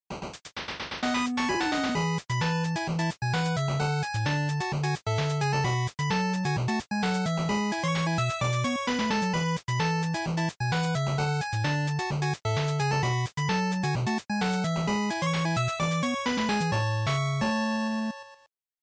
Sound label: NES Action Title2